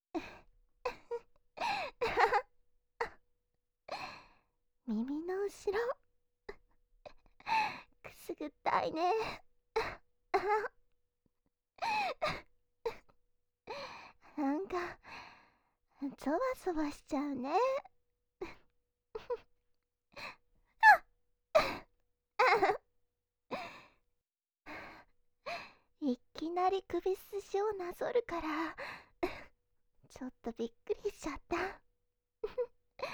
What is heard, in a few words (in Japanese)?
お隣のお姉さんがひたすらくすぐられる音声